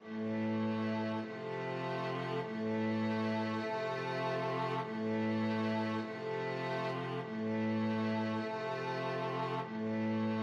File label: funeral march, string quartet; chopin